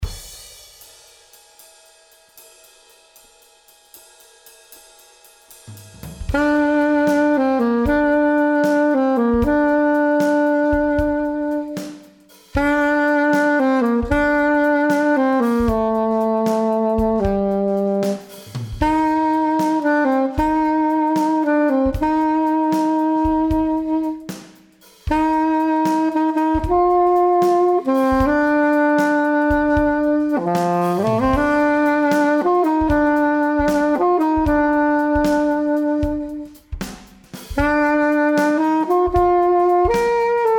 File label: alto sax and drums only